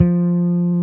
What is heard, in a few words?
BASSES, Roland